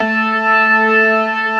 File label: STOPS, ORGAN; Keith Emerson Signature Series